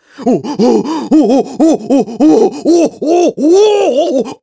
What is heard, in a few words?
Shake; Groose shaking Link violently; zelda sounds; Skyward Sword